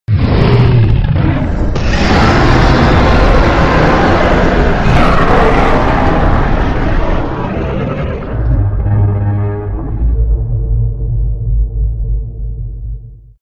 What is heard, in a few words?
roar; thanomegalodominus